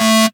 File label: Synths A; vengeance essential club sounds-3